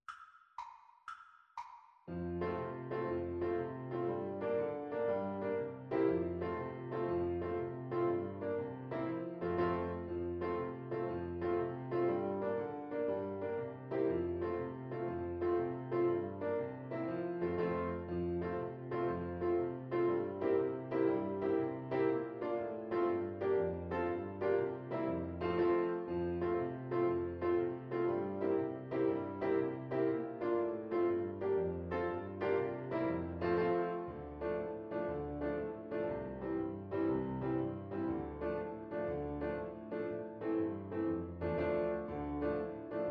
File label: Tin Whistle